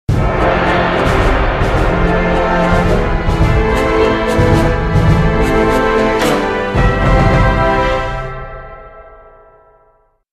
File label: pvp victory alliance; wow